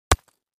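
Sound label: Brick Hit Sledge Hammer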